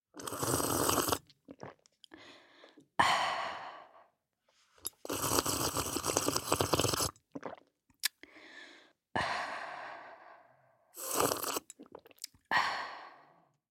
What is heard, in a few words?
hot beverage drinking and slurping with sigh of enjoyment repeated; DB studios; Foleys